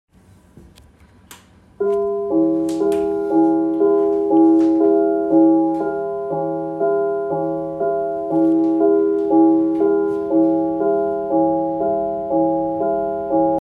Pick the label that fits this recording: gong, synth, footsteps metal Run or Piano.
gong